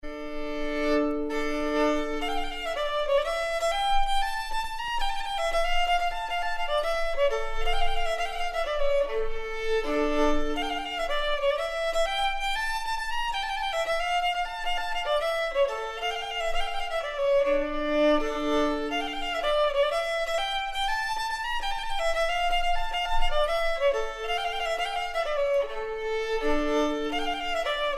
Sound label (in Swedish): polskan; Brunnsbergspolskan